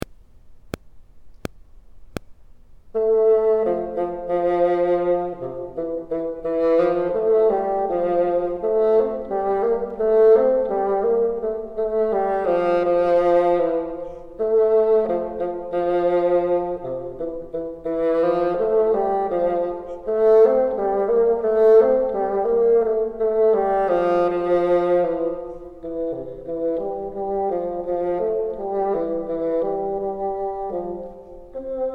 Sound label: bottom, bassoon